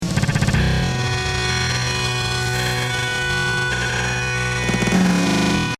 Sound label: mainriff; edge delay